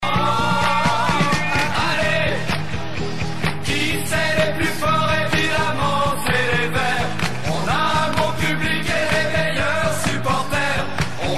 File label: ringtone